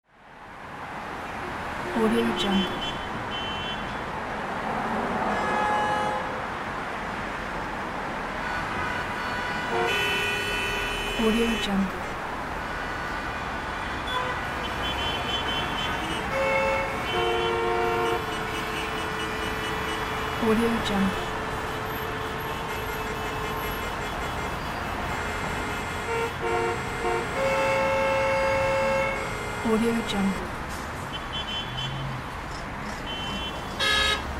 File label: traffic jam